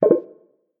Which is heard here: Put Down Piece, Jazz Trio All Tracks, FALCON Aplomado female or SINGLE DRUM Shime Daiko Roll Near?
Put Down Piece